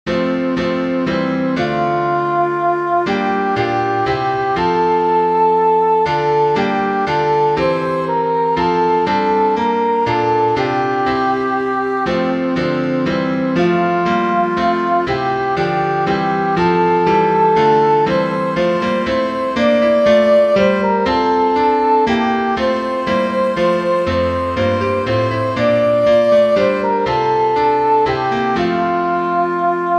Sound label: lambillotte soprano; LAMBILLOTTE “Come Holy Ghost”